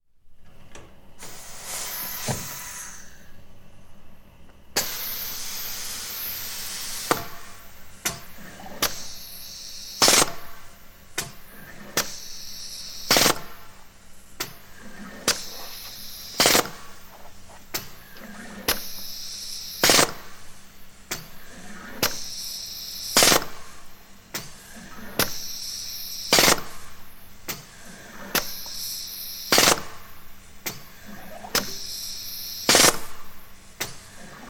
milling machine operating

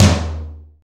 large room tom drum one shot d sharp key

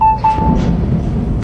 beeps and wind

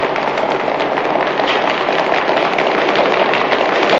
APPLAUSE GROWS LOUDER

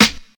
Old School Dry Hip Hop Snare Single Hit D Key; snare drum samples

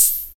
good open hat sample g key

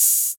raw pedal hi hat B key; hat samples

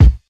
dry kick a sharp key; kick drum samples

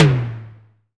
ambient tome drum sample c sharp key

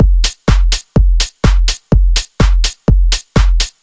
house - techno beat passage - fm - 125; drum loops

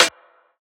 short reverb snare single hit b key; snare drum samples

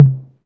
tom drum c key

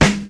90s rap acoustic snare sound g sharp key; rap snare drum

